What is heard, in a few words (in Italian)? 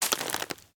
Alberi; BLIND QUEST - The Enchanted Castle